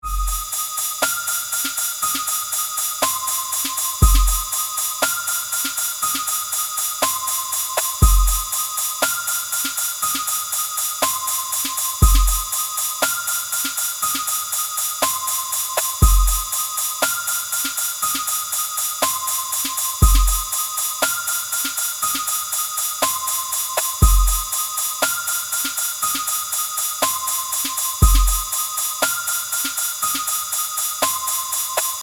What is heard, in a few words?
arp